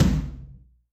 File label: Rock, Tom, Yamaha DTXpress-II